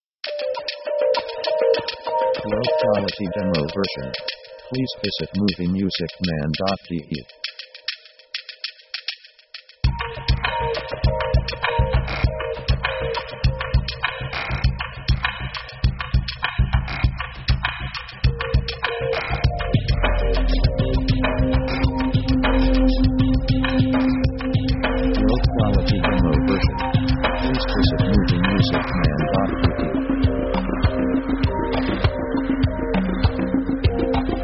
background with guitar; synth themes

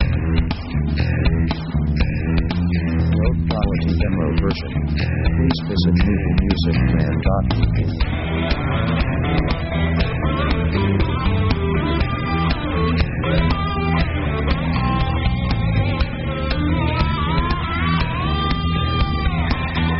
rock 120bpm heavy; dark; Dark mood

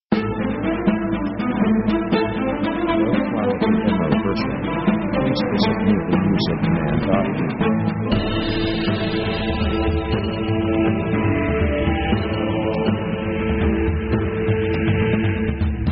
orc triumphant; Action themes

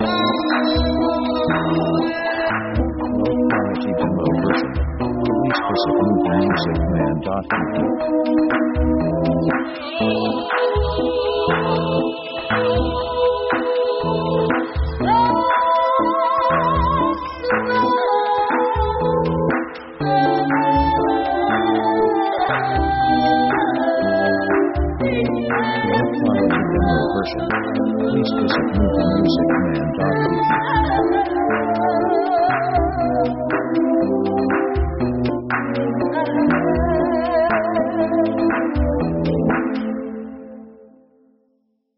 spanish dramatic; ethnic themes

